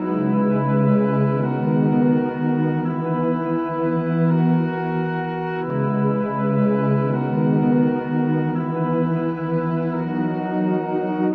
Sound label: strings worries abound; Loops; Sad